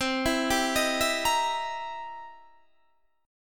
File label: C 7th Sharp 9th C Guitar Standard; arpeggiated; JGuitar